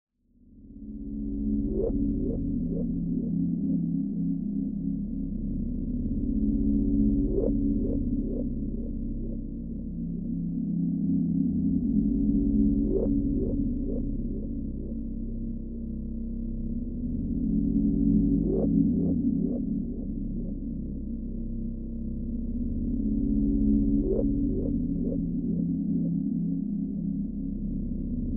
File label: Ambience; Black Mesa Terra